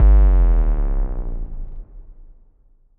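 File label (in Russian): звук заевшей кассеты от магнитофона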